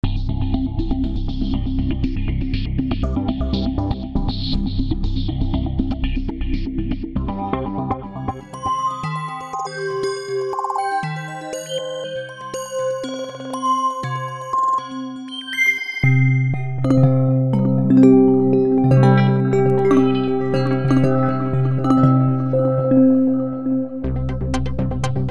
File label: Arp Rhythm